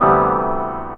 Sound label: CHORD L, Drum & Bass 160 BPM; Ueberschall; Drum & Bass Flash Trackz